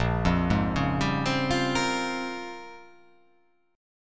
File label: Bb Minor 13th, Bbm13 chord; arpeggiated; JGuitar